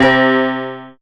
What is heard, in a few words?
Ethnic Synths, Dance Synths; Best Service ProSamples vol.37